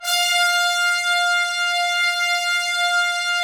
Trumpets; Roland - LA Composer vol. 4

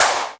CLAP, Dance Drums

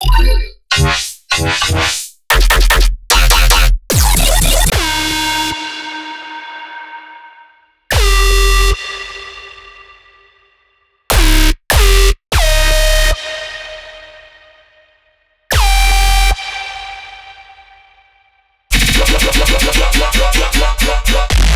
Bass Stabs

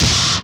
SCREAMFIRE, PHUZZ DRUMS; Spectrasonic Distorted Reality 1

